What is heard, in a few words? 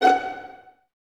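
violins F#5 pizzicato, String Ensembles; Miroslav Vitous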